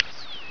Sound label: rpg aim off, weapons; XDM